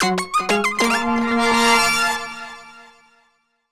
Finish Jingle; Chibi-Robo! Zip Lash

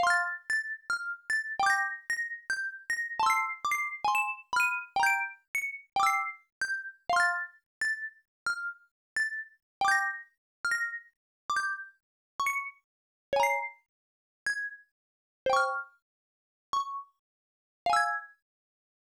Music Box; Pac-Man 2 The New Adventures; Genesis

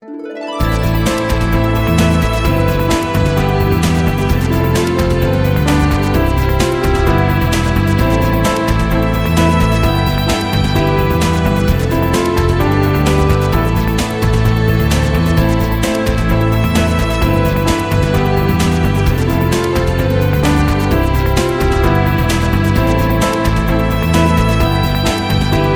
Minigame Victory; Naruto Ultimate Ninja Storm

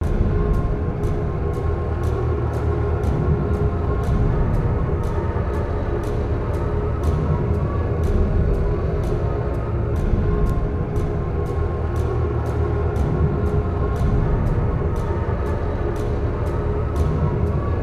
Cell Loop; Batman Arkham Asylum

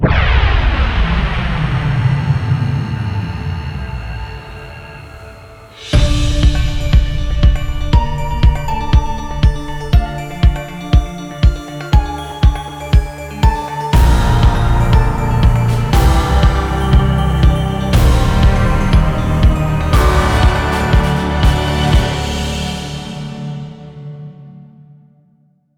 Zombie Revelations - Death

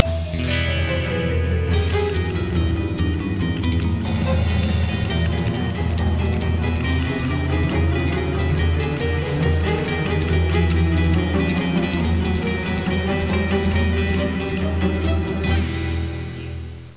Tension Stinger; freddi fish 4 the case of the hogfish rustlers of briny gulch

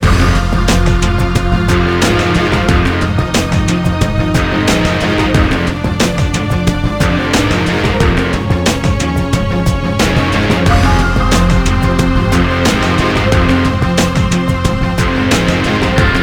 Battle

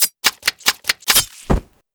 Kill Knife; Among Us

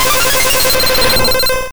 Hyper Beam Laser Direct; sfx; attack moves; pokemon; gen 1; rby

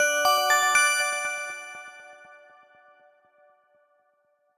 Wood Sign Board Jingle

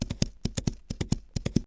Animals Gallop

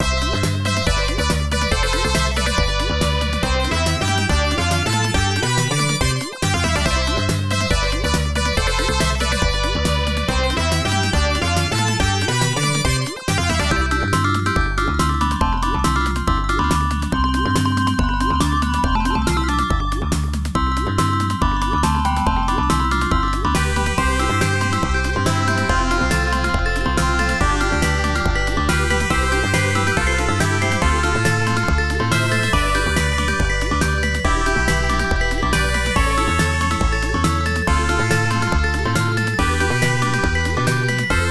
Normal Mode BGM; Keeper; x68000

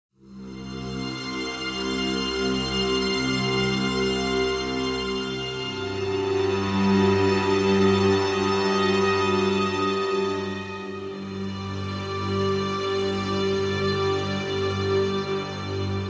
Strings; Satiate

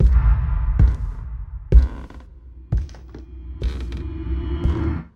Footstep Stinger

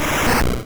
Fire Punch; sfx; attack moves; Pokemon; gen 1; rby

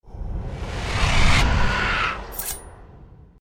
Trample Finish; Goddess of Trampling

